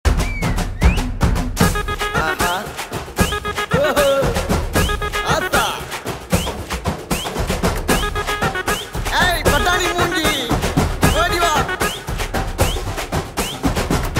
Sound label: Sivakumarin Sabadham Drum Beats BGM; Tamil